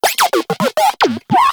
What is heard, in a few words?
Moa Compassionate Vocal Greeting Excited; Pets; Warframe